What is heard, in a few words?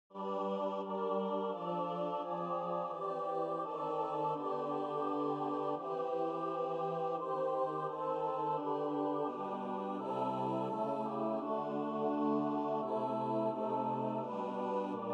choir